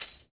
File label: Tambourine, Percussion; Roland BK-5; Tambourines